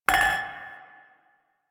Glasses Clink; Ambience; Warframe; The New War; Quests; Zariman

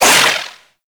Void Projectile; Weapons; Warframe; Duviri; Incarnon Adapters

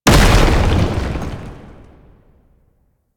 Containment Protocol Impact; Warframe; Warframes; Qorvex

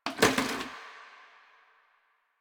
Janitor Plastic Bottle Water Drop

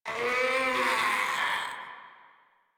Infested Runner Attack Call; Warframe; Runners

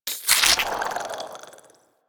Alchemy Lab UI Cyst Contract; Warframe; Liset

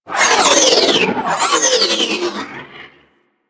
Sentient Ship Roll; Warframe; Enemy Ships; Space Fighter Sentient Coil Agent

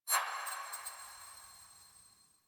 Choice Menu Hover; Warframe; Quests; The New War; UI; Table For Two

